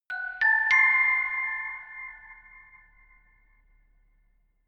notification glockenspiel creepy triad up diminished